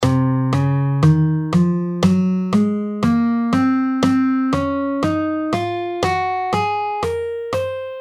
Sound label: C Locrian 7th Mode Of C Major, guitar